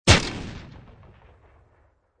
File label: Grenade Explosion, Sharp explosion, medium distance, grenade exploding with sharp crack